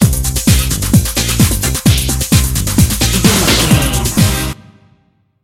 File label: come strong bumper, Dance, EDM, Club, Eurodance, Energetic; Exercise; Aerobic; Aerobics; Spinning; Workout